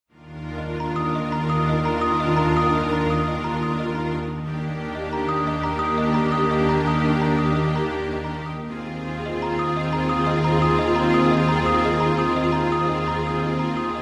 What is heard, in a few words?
Music Logo; Contemplative Bell Pattern Over Sustained String Chords.